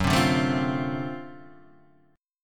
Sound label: D 7th Sharp 9th Gb